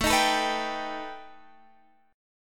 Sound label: B Augmented 7th A